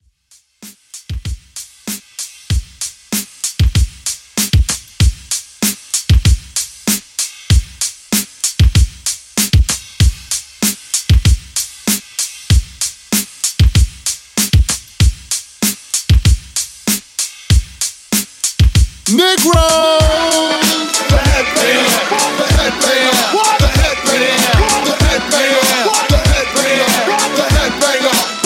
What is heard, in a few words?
Old School Redrum 90Bpm